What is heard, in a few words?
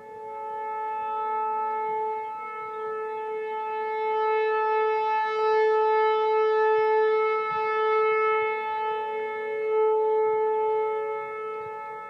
Warning Siren; Wilmington, OH; Police Department